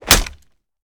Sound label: punch gun wall; gmod; arccw uc commons attachments